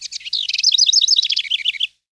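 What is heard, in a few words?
tropical bird; Counter Strike Model Pack; CS:GO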